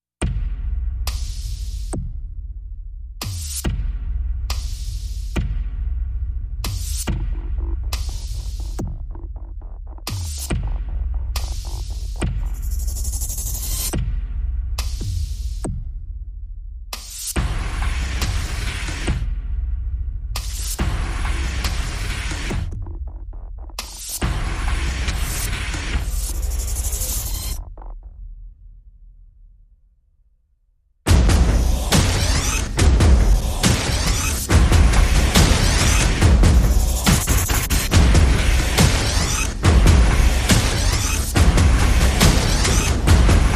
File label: Percussion Rises and FX